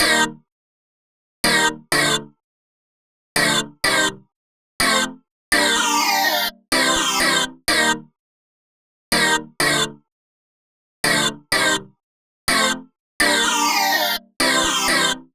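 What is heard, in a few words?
Drop Synth 125 D minor Dry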